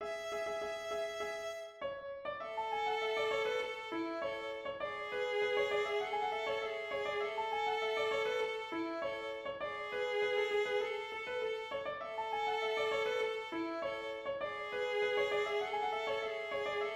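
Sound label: polka, melody harmony; Countess of Dufferin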